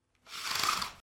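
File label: sound of curtain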